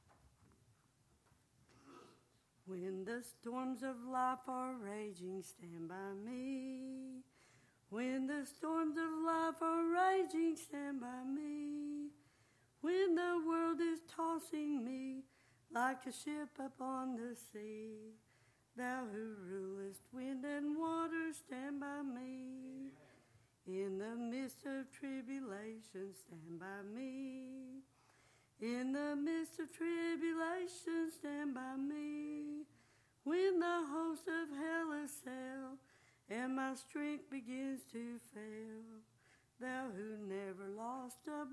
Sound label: singing